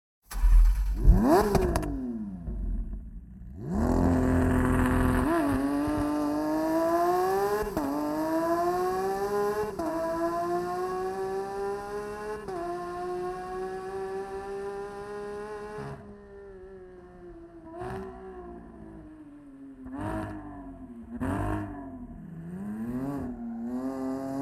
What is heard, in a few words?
Aventador SVJ